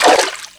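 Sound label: water wade; midnight guns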